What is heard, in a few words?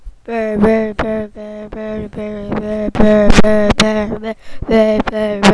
bell